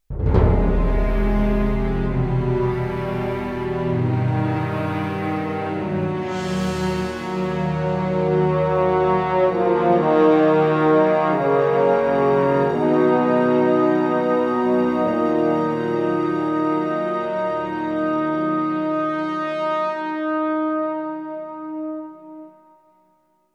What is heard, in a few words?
Stinger Drama; Stronghold Legends